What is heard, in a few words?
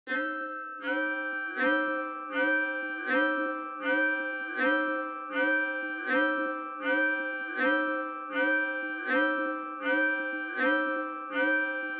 ambient